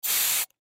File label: Air Can Spray Short Aerosol; Spraying; Blastwave; Foley